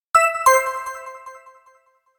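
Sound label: electronic ping notice; anemoi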